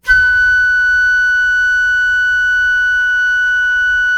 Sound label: FLUTE FF F#6; Greytsounds; Philip Wolfe David Crozier's Saxophones